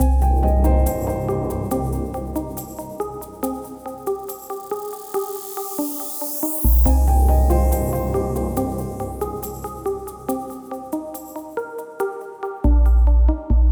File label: Intro Blips, Loops